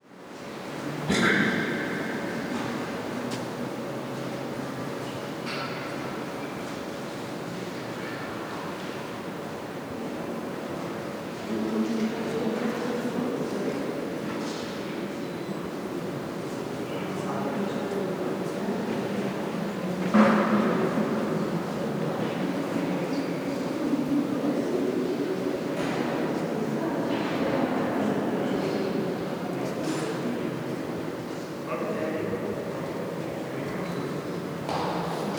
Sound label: BERLIN, NIKOLAI CHURCH ROOMTONE, PEOPLE CHATTING, BIG ROOM REVERB, MIC TO THE ENTRY; Creative Audio Pool; URBAN BERLIN VOL. 2